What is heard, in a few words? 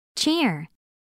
cheer w